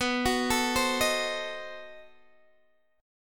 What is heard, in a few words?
B 7th Flat 9th, down, Guitar, Open G